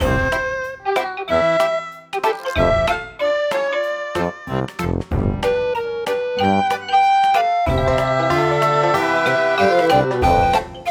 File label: Beats; Sparklite